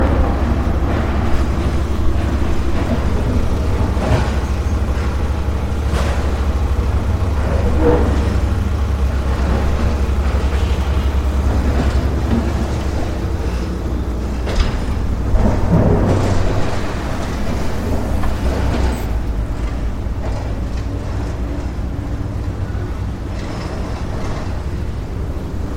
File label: demolish building